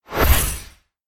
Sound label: whoosh fast; sonniss gdc